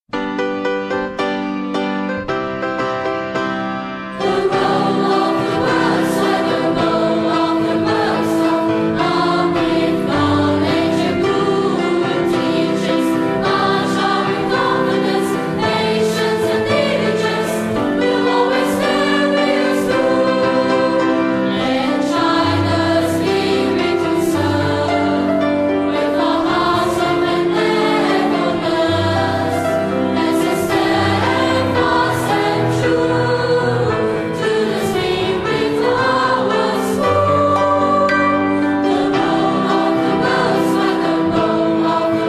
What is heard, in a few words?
school song (choir)